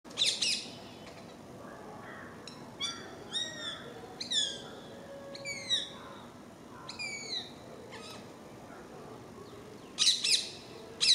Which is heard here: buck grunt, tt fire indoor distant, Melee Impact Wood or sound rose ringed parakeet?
sound rose ringed parakeet